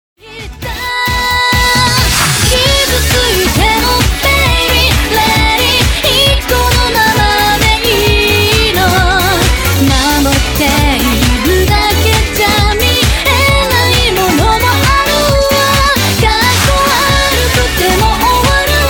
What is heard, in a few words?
Pops